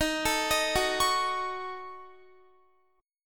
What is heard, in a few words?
D sharp Minor 7th Flat 5th D sharp, down, Guitar; D#m7b5; arpeggiated; 4E,4A,5D,5G,5C,6F; chord shape